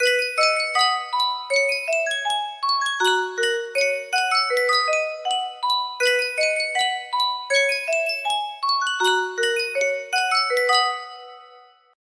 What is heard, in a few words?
Sankyo music box